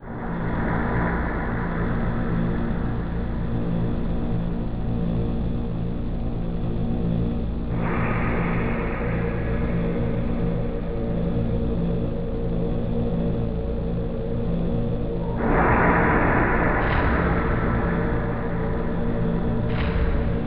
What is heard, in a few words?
pc startup; second reality